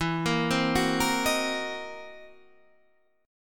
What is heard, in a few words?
C 7th Sharp 9th Flat 5th E